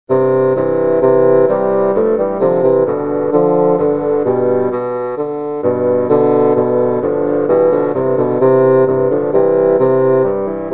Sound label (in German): trio; handschuh maeuslein